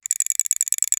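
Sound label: continuous fast, mouse wheel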